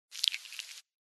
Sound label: Suck; Lewd SFX